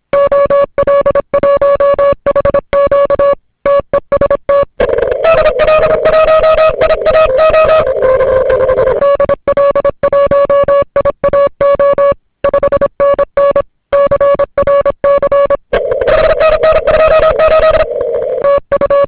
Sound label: cw; iaru; ol1hq